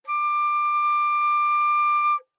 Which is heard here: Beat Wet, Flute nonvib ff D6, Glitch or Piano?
Flute nonvib ff D6